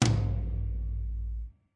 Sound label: Dubbling Heavy Tom, DrumKit; REAL LIVE KITS; Osama Qureshi DrumKit